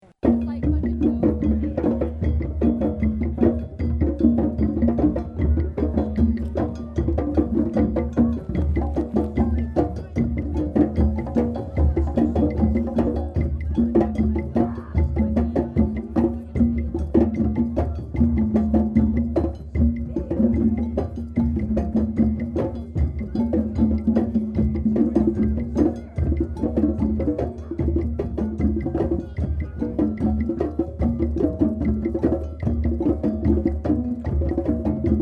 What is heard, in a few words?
Drums; Utah 2003; Rainbow Music